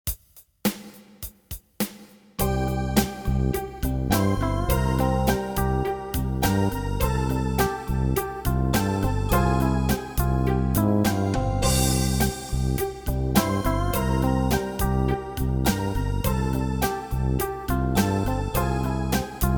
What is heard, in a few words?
Staccato Improvisation